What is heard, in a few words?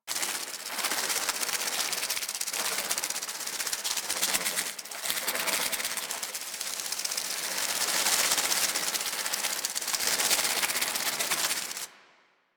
Devour Enemy Loop; Warframe; Mummy